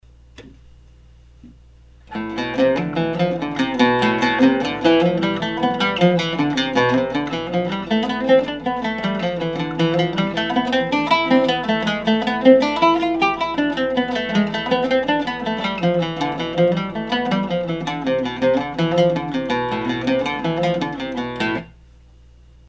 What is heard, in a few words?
kapris exercise, oud